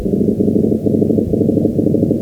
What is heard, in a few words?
100 Hz Pulsing